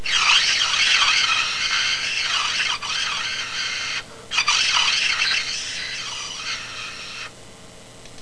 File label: plastic straw panpipes